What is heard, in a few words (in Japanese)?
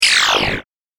ショット